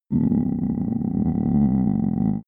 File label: stomach growl; soundpool